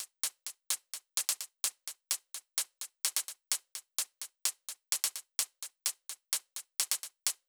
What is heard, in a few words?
128BPM Drums; Vengeance Samples; Body Kit